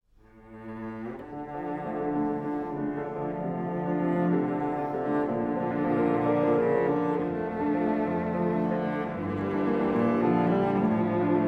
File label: Mendelssohn Trio